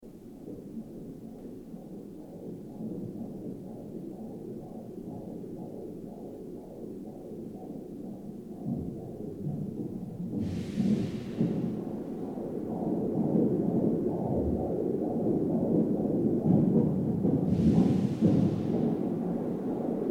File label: Double Beat